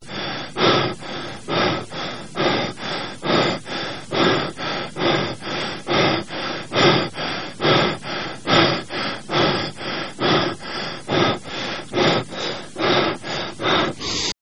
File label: Breaths Male Fast